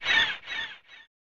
Seagulls; cannon fodder; snes

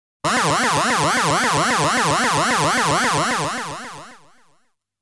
Prisoner Beam; Zero Wing

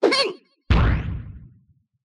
bomb; Mystical Ninja Starring Goemon; sasuke

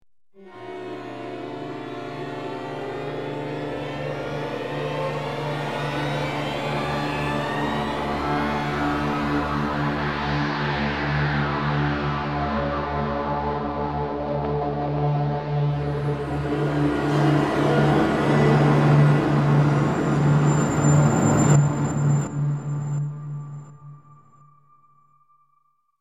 Crawl Under House; medal of honor warfighter

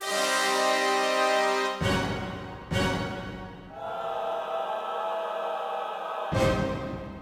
Action START; bolt 2008 pc gamerip

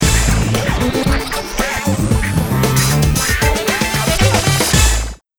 Disco; Disco Wreaking Ball; Skylanders Giants

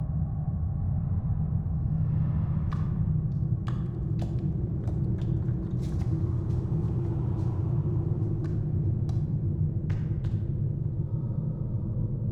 Ambient

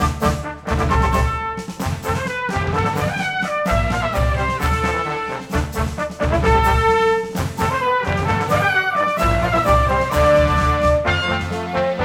Stereo - Main Menu; SpongeBob SquarePants Lights Camera Pants windows

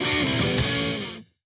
Baseball; 1-2-Switch

